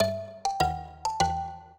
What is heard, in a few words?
minuet, mbira; Mozart